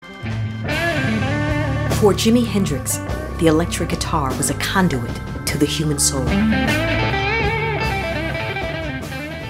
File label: Gibson Flying V